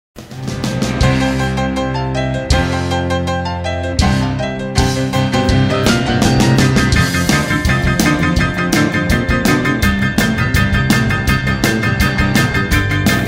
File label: variety band